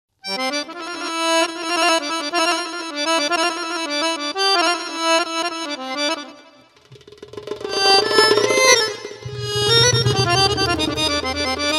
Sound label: Takasiem Darbouka, oriental belly dance; Oriental Belly Dance Vol. 1(Darbouka & Tabla 2 Vol. 2)